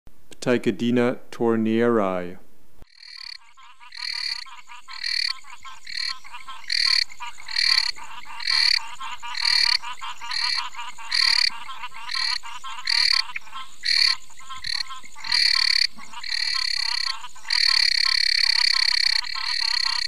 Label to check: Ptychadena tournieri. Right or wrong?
Right